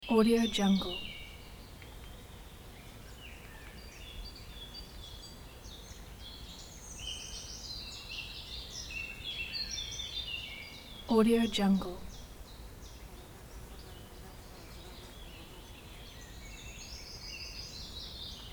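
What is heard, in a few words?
forest animals birds midday chorus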